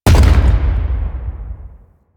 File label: Grineer Thumper Bot Thumper Impact Close; Movement